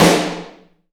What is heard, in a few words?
SNARE, WET SNARE